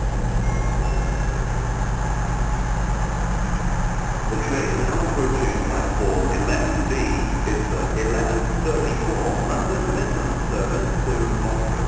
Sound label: birmingham, bus